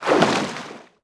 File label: shark splash out; Tony Hawk's Project 8; XBOX